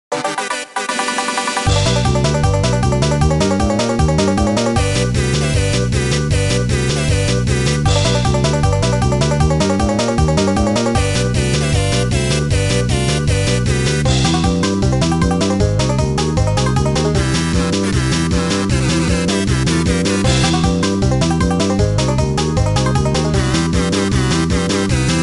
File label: Banjo